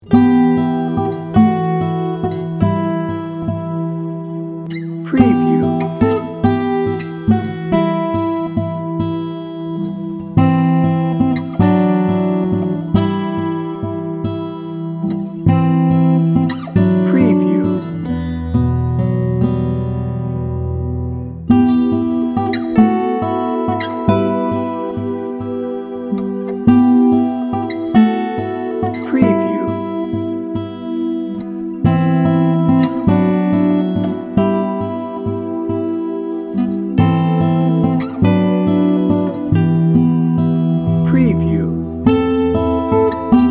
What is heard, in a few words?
warm drama